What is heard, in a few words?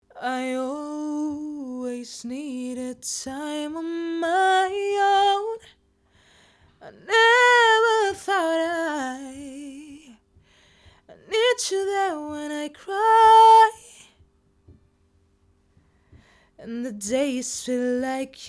Lead Vocal Before Female